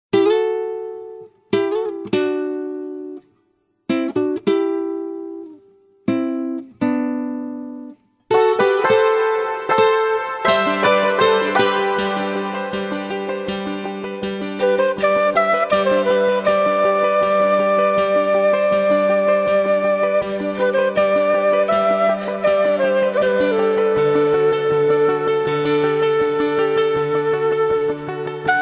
pan flute; Electronica

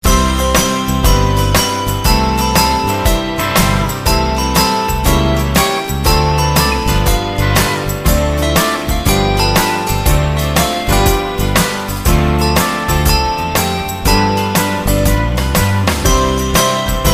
ringtone

